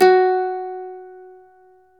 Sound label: MANDOLIN